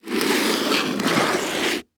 Foley Footstep Hard Sole Dress Shoe Scrape On Cement Slow; Foley Footsteps